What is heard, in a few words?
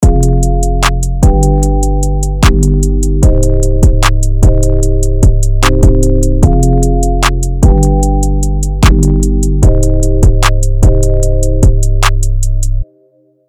Docu Beat